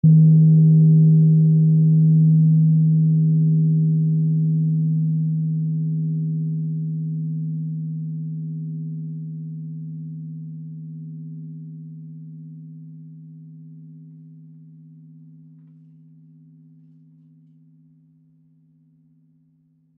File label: Chinese Gong 14 Inch Low Bass; Cymbals; Blastwave